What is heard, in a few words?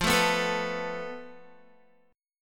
F Major Flat 5th, Guitar; FMb5 strummed; JGuitar